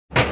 junk metals, junk yard metallic and impact fx; nova scrap-yard; nova sound

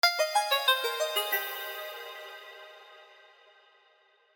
synth phrase; nacre

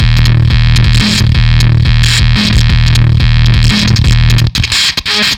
Fuzztest drum frenchtoast

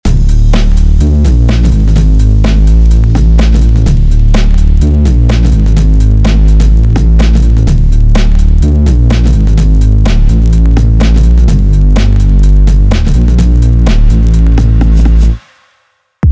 fuzzy waves; iceberg audio

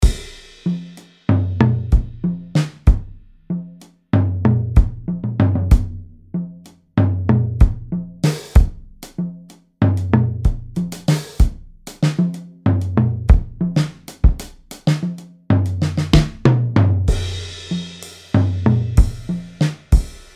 loop kit 95bpm 9 8, drums; kensal clubdate drums; majetone

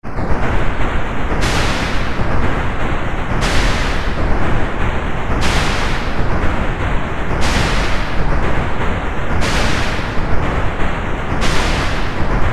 crunch wave; cirrus

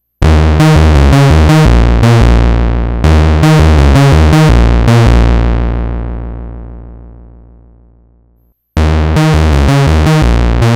PWM Synth; strobe

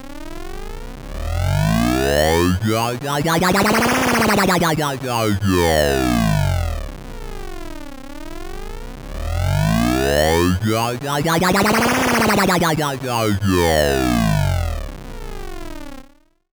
weird mod

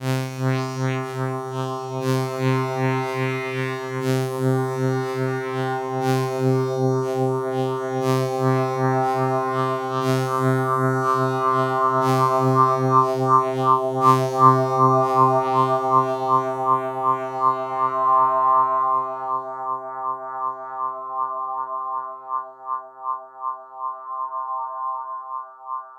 echo saw and echo mod; firefly synth 2; sjoerdvankreel

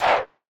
group trap chant vo; nova sound

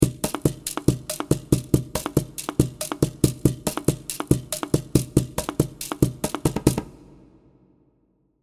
tiki percus; tiki percussion kit; tiki instruments; chiqui audio

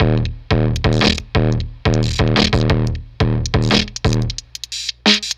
Fuzztest drum ultrafuzz

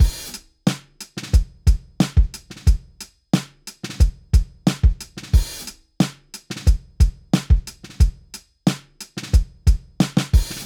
drum groove plugin off; analog glow; tonalmark

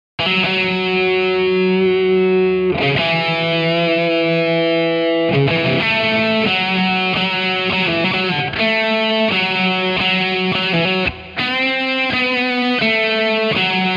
les paul amplif; chiqui audio

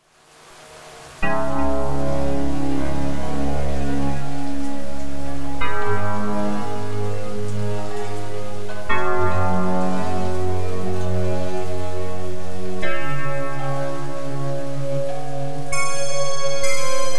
laserkeys, horror synth; monstrous horror synth; by super synths

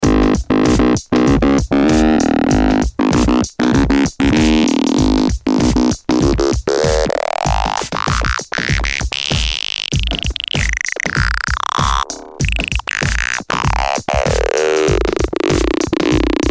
Wooden Bass; Himalaya; S3K VSTi

